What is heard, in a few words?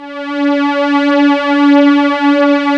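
strings lush pad; String Master Series; Combos 2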